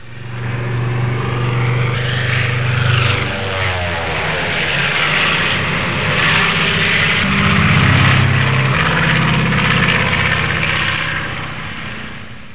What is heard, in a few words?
aircraft